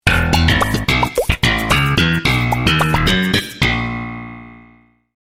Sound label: sitcom transition bass riff; seinfeld